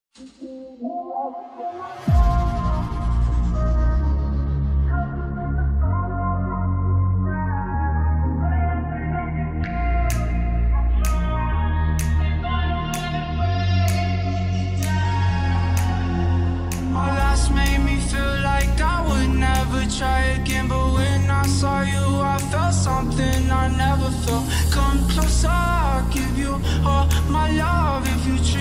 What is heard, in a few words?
remix bass boosted